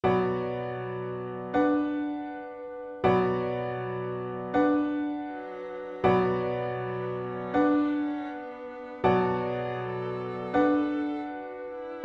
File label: viola